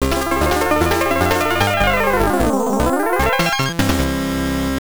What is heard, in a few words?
Area Clear; J.J. & Jeff; TurboGrafx-16